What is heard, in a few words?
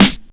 focused steel snare drum sound g key, snare tuned to the G note